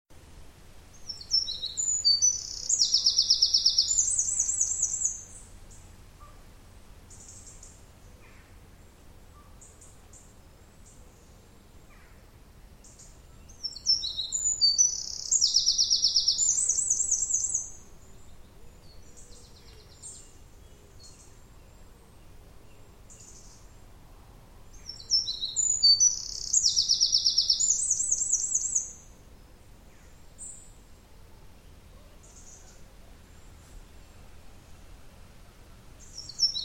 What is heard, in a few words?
Troglodytes troglodytes; SETE